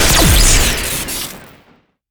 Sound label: shooting star shoot crit; tf2